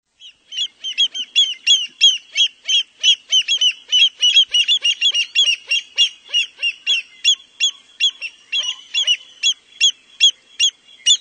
American Avocet; Birds

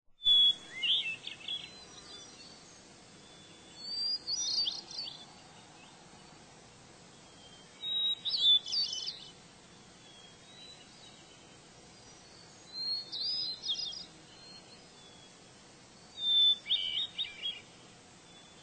Hermit Thrush; Birds

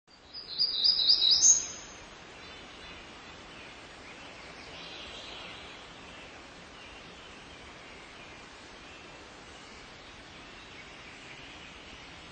Black-throated Gray Warbler; Birds

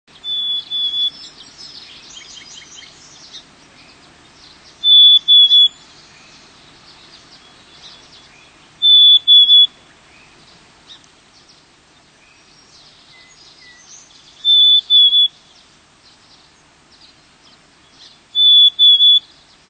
Black-capped Chickadee; Birds

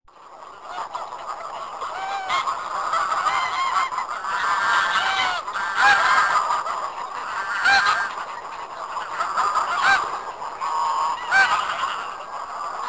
Greylag Goose; Birds

